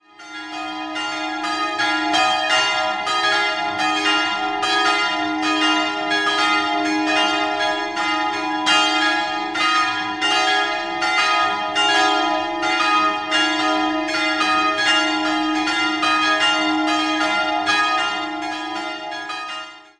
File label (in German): Schwarzenthonhausen, Glocken, Filialkirche St. Andreas; 3-stimmiges Gloria-Geläute: es''-f''-as''; Die Glocken wurden 1975 von Rudolf Perner in Passau gegossen.